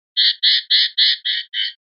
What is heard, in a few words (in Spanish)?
jases, pardillo